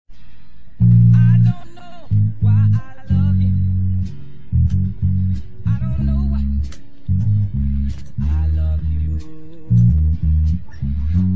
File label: bass cover